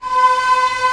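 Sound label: strings F#2 pianissimo; Sound On Sound - Peter Siedleczek's Orchestra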